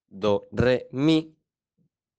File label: Do re mi